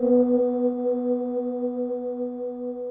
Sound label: Choral Bells